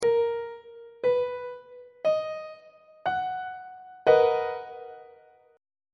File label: a double sharp major 7th 3rd inversion; on treble clef